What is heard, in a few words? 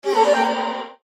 win; Book Of Queen